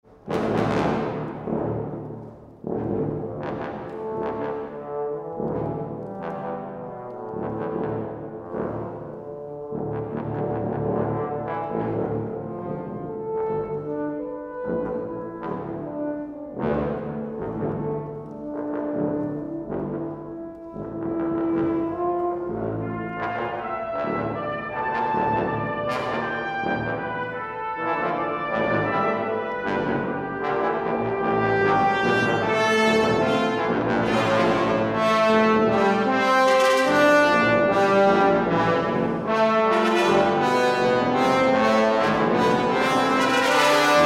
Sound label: fanfare